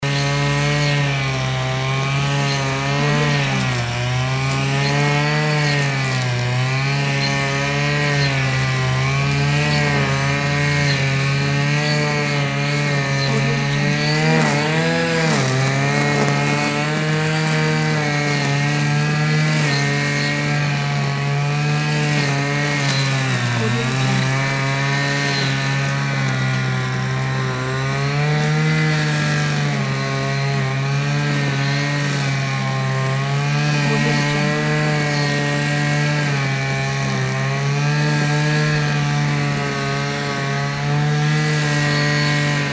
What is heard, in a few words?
lawn mower; soundfoodsfx